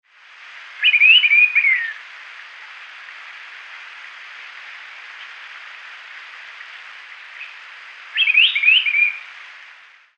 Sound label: Antilophia galeatus descending song; Aguaranu